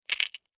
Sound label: Insect Claw Snap, Insect, Claw, Snap, Movement, Bug